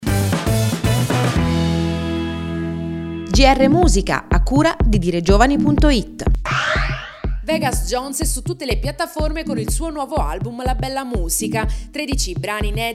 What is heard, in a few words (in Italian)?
musica